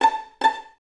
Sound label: A 5, Violins Pizz; 11 Violins; Miroslav Vitous - String Ensembles